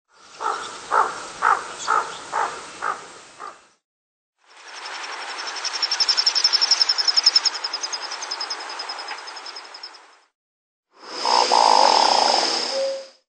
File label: Hooded Merganser; Birds